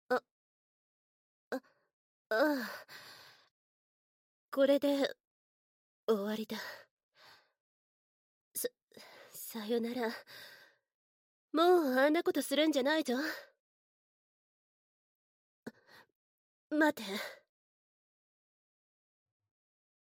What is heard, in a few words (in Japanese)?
耳キス＆耳舐めパート, ASMR; 反転ver.